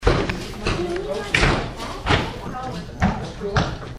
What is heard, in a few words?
Arrows landing Sounder